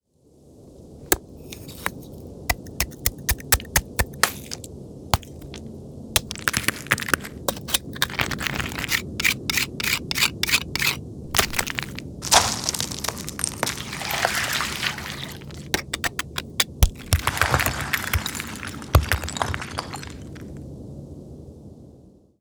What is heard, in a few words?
breaking ice